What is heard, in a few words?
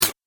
Droid Click, Robot Sound Effects